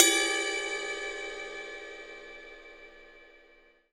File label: RIDE 20 BELL, Drums; Big Fish Audio; Ross Garfield Drums VOL-2; TUCSON KIT